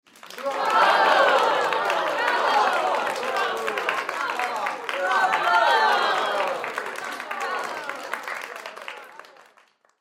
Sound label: Human Crowd Studio; Crowd Reactions Bravo Multiple Clap; Sound Effects:Voice